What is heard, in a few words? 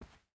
footstep boots cloth walk; Rust